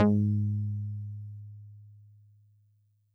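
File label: Solid Bass DX100 G#3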